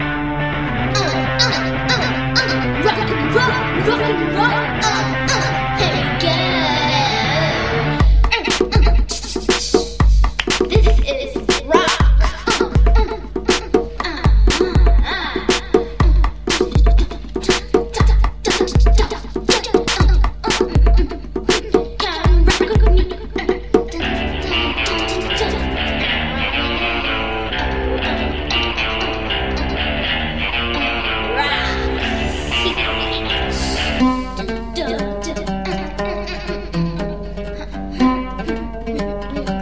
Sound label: rock n roll